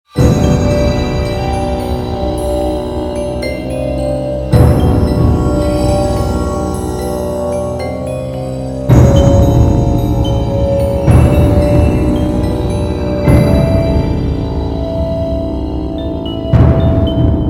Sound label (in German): Cutscene Auftauen Music; Jack Keane 2 The Fire Within; Windows gamerip 2012